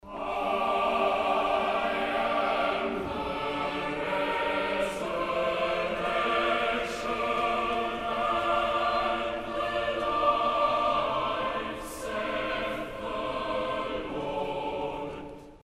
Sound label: CHOIR QUEEN FUNERAL